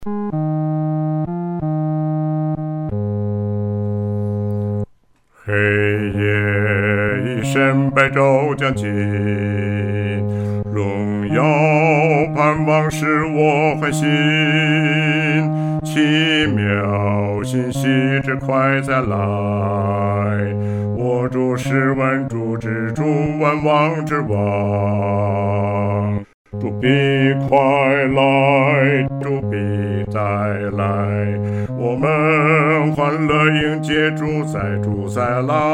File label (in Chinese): 独唱 第四声; 主必快来